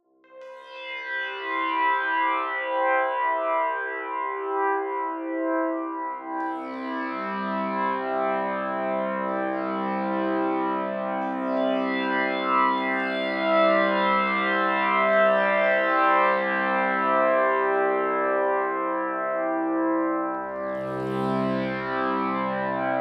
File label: Drone Pad Wet